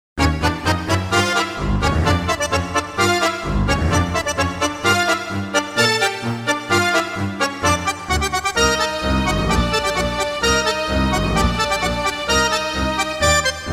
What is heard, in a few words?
Tango